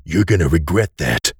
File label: Pain; Duke Nukem; Forever 2001